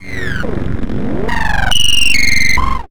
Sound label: weird; Best Service - XXL Production Effects